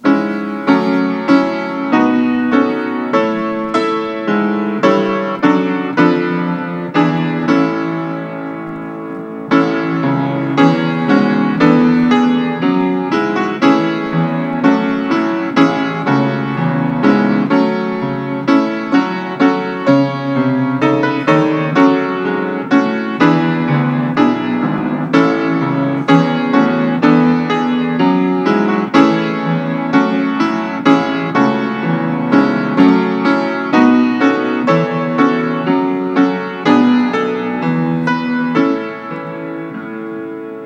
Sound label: School Song (Piano)